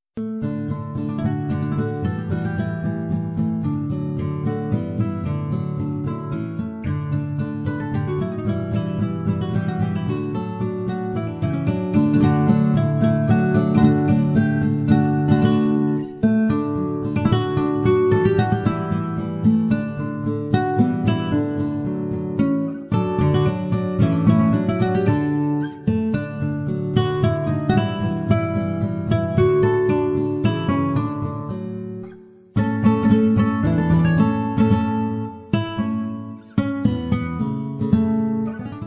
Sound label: Guitar